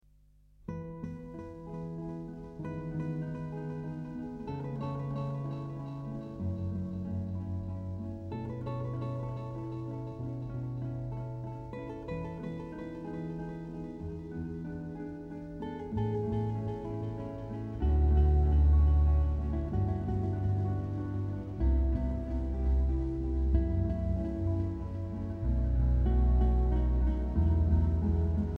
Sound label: obvious delay and repeats, guitar, pt2399; connection and testing